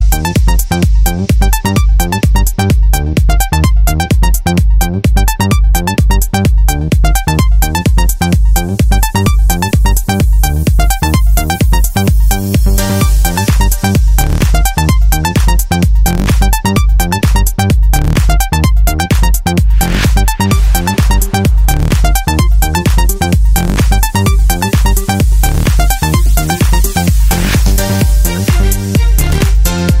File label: synthetic disco